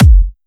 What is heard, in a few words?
normal hitnormal; osu!